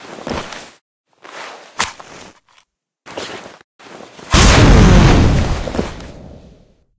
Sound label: taunt gas blast; video games; source engine; tf